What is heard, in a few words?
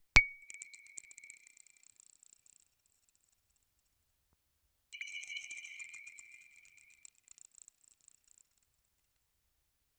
the crystal shards slowly